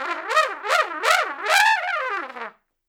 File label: Trumpet Noise